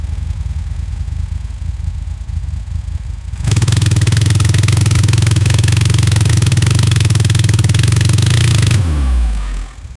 Royal Enfield Continental, motorcycle

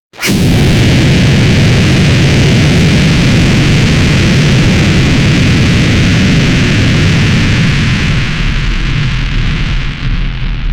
bassie power on sound

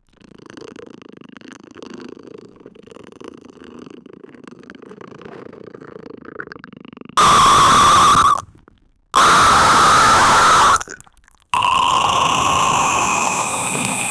slurping loud unstoppable; drinking

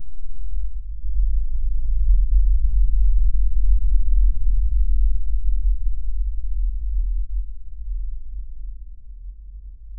epic cinematic void deep

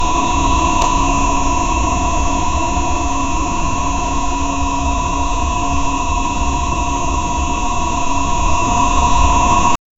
bleeping a curse word, censored

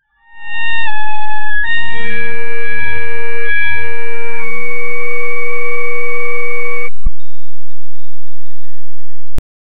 glitch effects soft sound; ear piercing

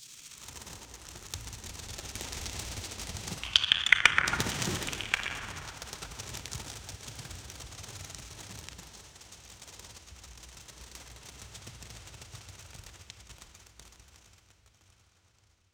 realistic sounds of stone, cave

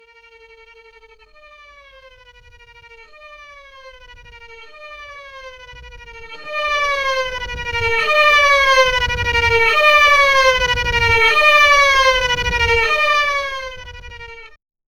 transformation of violin, synthesis

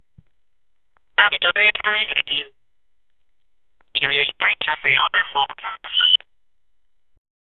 phone not answer; awkward

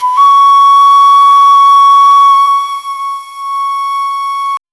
asian dark flute one note

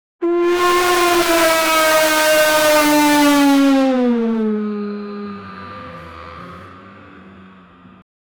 oh no sudden accident sound

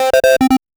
chiptune pixel art, digital

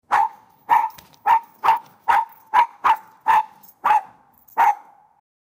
a dog sit on a, outdoor

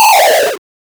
8 bit retro sound of an

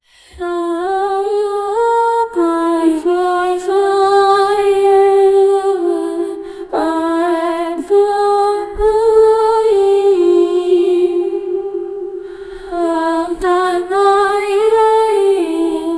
female singers

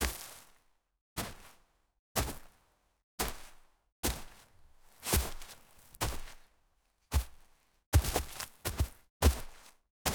single boot walking, short decay

